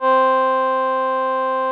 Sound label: VP-330, String Machines; Best Service; XX Large Most Wanted 2